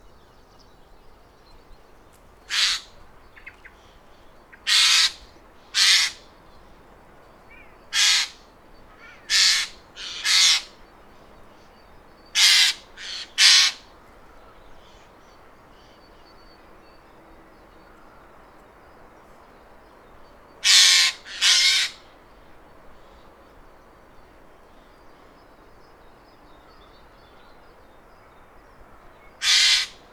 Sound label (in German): Eichelhaeher Garrulus glandarius, vogelstimmen